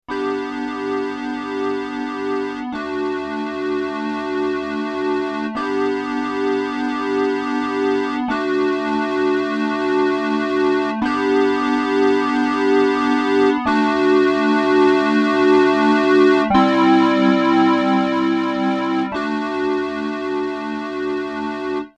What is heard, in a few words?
midi